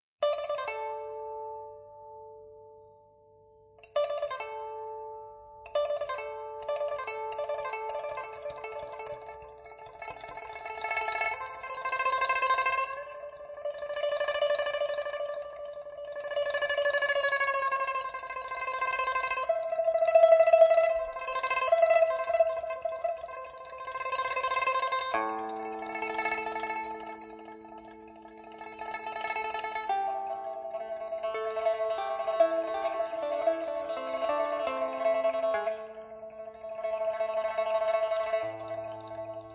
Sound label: Chinese Folk; Chinese Folk Roots